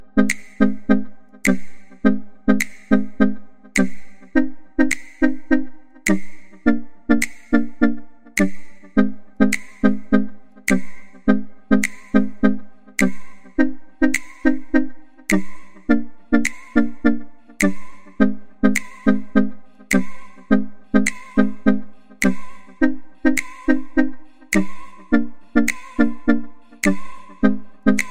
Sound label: Clicks Cool Instrumental